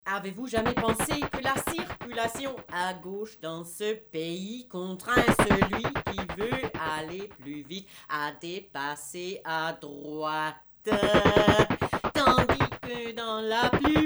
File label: Percussion=Voice; Paradigm Discs